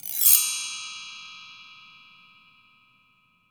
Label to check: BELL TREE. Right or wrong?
Right